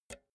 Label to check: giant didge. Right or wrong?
Wrong